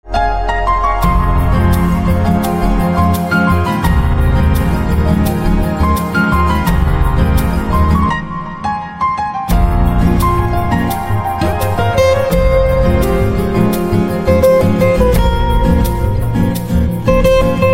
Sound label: Guitar with Piano